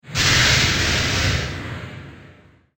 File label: Epic Impact; Miscellaneous Impact Sound Effects